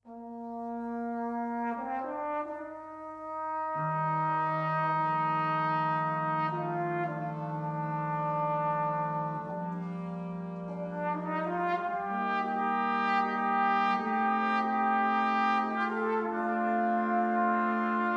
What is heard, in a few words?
Quintet